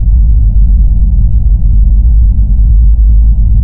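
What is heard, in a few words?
control room ambience; KATANA; Daikatana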